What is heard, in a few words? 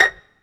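STRING PIZZICATO F1, STRING SECTION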